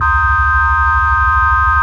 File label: ORGAN, Drum & Bass, 160 BPM; Ueberschall; Drum & Bass Flash Trackz